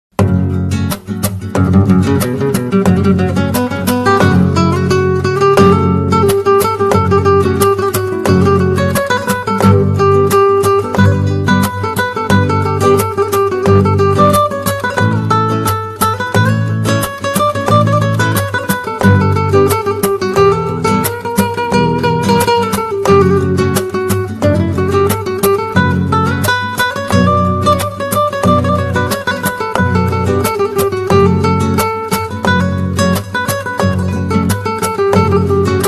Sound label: guitar